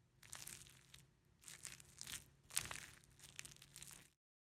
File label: squishy long; soundscrate